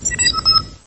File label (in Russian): elektronnij zvuk zvonka